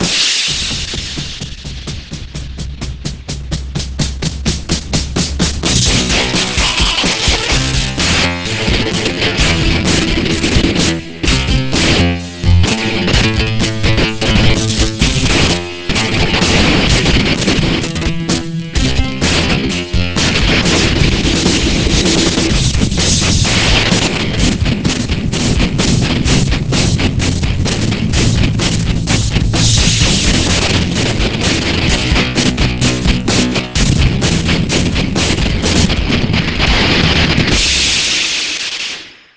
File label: generic rock; opus